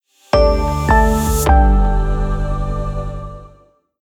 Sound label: Sound Logo